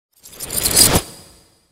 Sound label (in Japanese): 氷魔法; モノラル